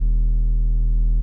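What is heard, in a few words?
electric sine low loop; electrical sparks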